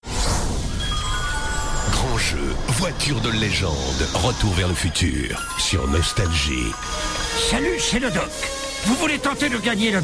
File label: jingle; nostalgie